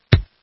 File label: bounces; Picture Keyboard